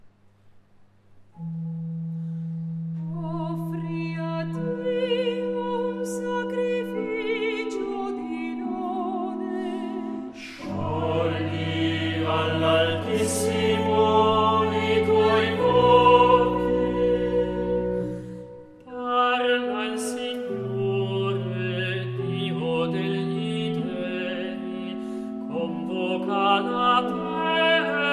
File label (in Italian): Salmello; Sabato Veglia; dopo la II lettura